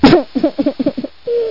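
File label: plach, crying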